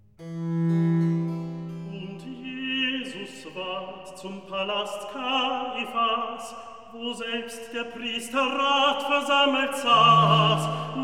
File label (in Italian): Recitativo; Und Jesus Ward; Handel; Brockes Passion